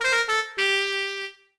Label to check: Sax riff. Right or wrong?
Right